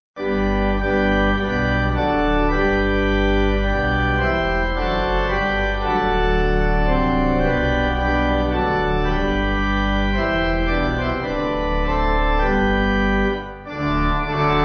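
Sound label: Pipe organ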